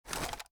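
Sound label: gear rattle weapon large; viper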